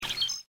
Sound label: bird